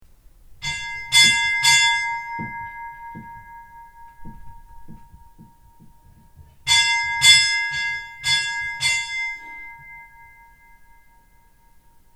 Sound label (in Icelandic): oddakirkja minnsta, kirkjuklukkur